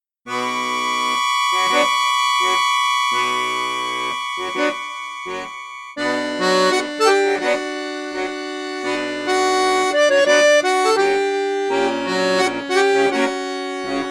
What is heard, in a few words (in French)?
registre basson flute juste, accordeon; cavagnolo professionnel 12